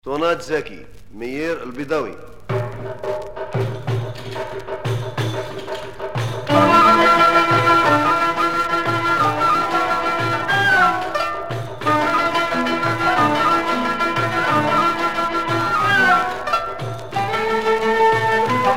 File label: Mizrahi music with derbuka; Israeli; Mizrahi music with derbuka released on Zakiphon, a sublabel of the Israeli label Koliphone.